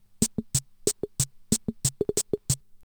CHACHA, Eminent-Solina Rhitmix drum machine; 300 Drum Machines

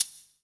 SHAKERS; Best Service; Dance Mega Drums CD2

